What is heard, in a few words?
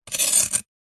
nature ice block sharp knife scrape